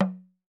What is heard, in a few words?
TAM TAM, African Drums